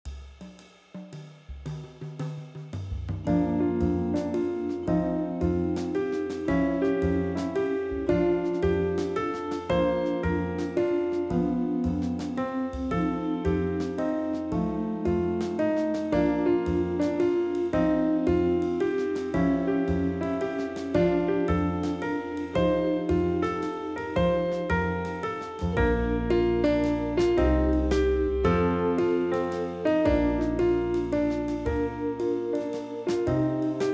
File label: Jazz Waltz